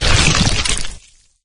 carrier walk; flood

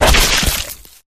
flesh destroyed medium; flood

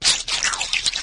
move, flood infection

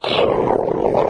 melee, flood

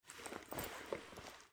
generic cloth movement, weapons handling

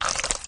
die; predatorcz; halo; flood; carrier

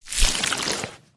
human form long move; flood

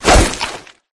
melee swish; flood

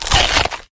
infector bite; flood

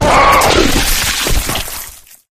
flesh destroyed large; flood

